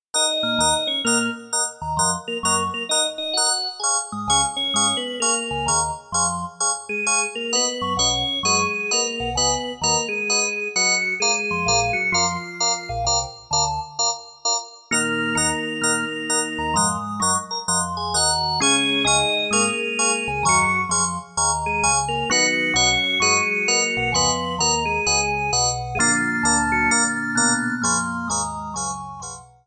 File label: organ